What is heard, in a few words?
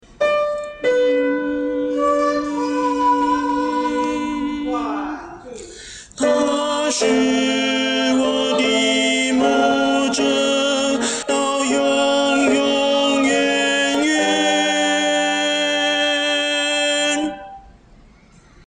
tenor